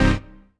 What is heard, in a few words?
dance chord